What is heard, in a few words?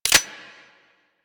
Tommy Gun Reload; Weapons; Warframe; Tenno